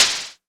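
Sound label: snare, ARP Odyssey Drums; Polyend; Simulacrum Sounds; 1 Shots; Synths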